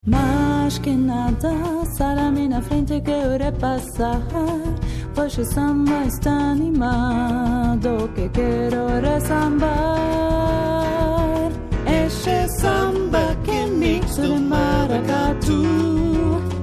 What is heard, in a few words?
loop